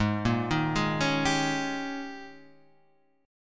G sharp Major 7th Suspended 2nd Suspended 4th, Guitar, Standard; arpeggiated; chord; 4,1,1,1,2,3